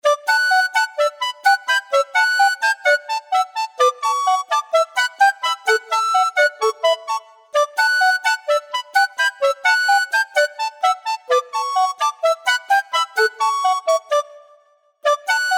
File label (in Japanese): リコーダーのやつ